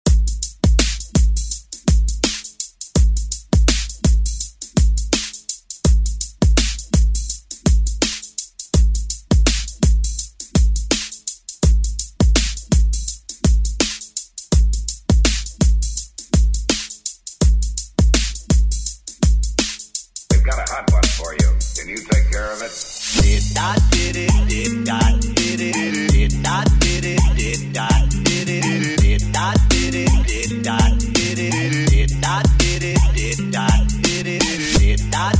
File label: Rock Redrum; Deejay Hit